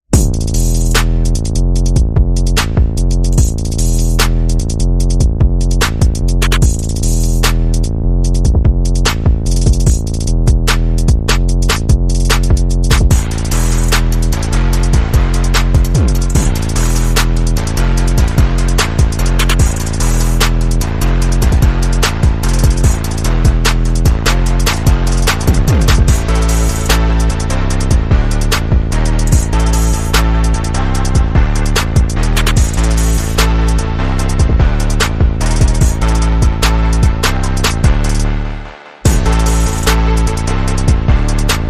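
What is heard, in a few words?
Synth Bass and Percussion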